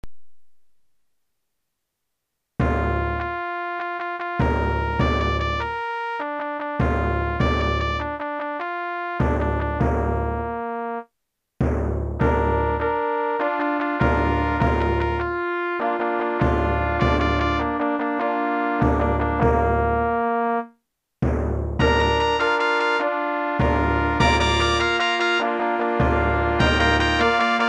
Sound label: Fanfare